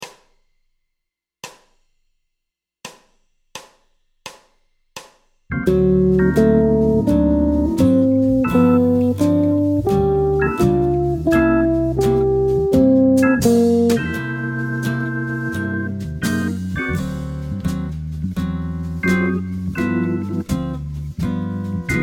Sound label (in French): arp liaison D7 G7 C7, guitare jazz; ii V7 I∆ majeur; transformations de l arpege de liaison